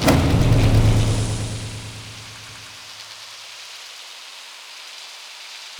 blood mist; dota; bloodseeker

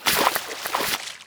arc gore; weapons; dota; pudge

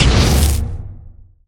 projectile impact; weapons; dota; phoenix

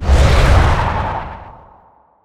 netherblast precast; dota; pugna

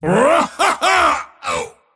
move pain; dota; mars

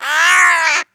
penguin; tusk

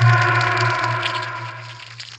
ink phantom death; weapons; dota; grimstroke

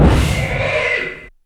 reality rift cast; weapons; dota; chaos knight

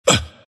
grunt; dota; ember spirit

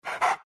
pant small, greevil; npc; courier

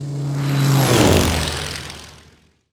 plane; dota; crownfall

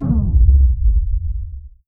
magnetic field cast; arc warden

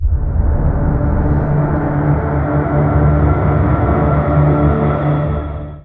magnetic field; arc warden

